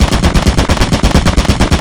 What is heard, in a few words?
turret fire